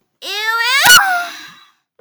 uwu

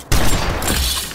shot

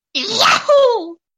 yahoo

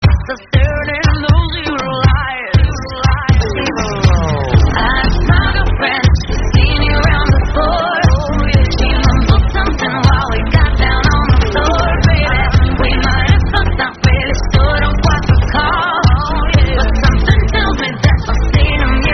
dance break

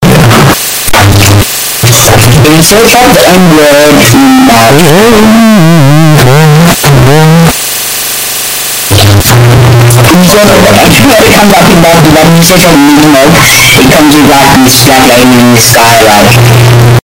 earrape

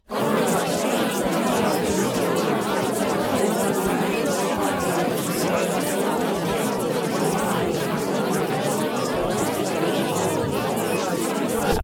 chant; bfdi

